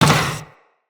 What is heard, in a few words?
Moa Combined Step Default, Footsteps